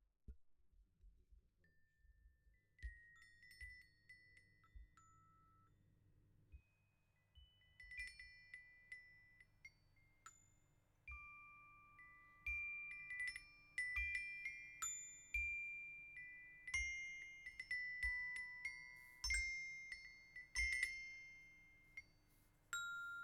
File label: xylo